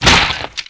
coelacanth die

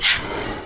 bloody knight stand

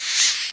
mantis move; angra

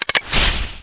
grenade fragment darkness; night watch

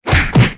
fallen empire

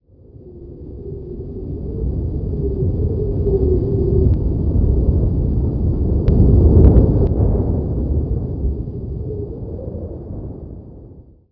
des wind

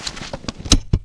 equip item

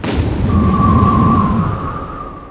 galestorm

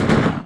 armed villager attack

